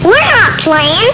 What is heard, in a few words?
playing